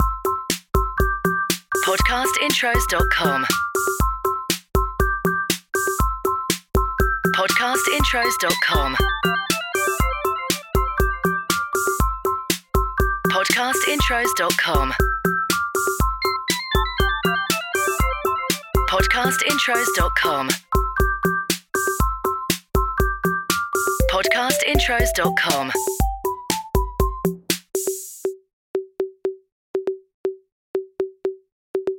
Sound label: CHILDREN; Podcast Watermark